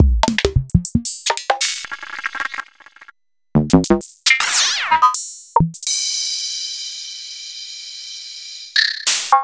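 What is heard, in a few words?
Roland MV8800 drum HOUSE KIT; HOUSEELE KIT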